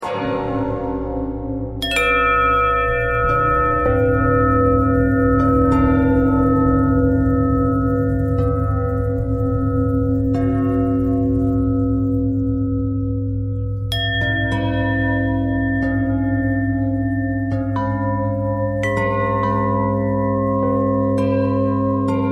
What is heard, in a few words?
bell twist